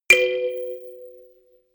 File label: notification kalimba rattle fourth